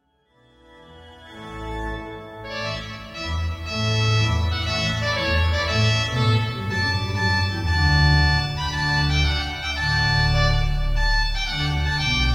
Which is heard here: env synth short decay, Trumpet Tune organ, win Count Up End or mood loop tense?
Trumpet Tune organ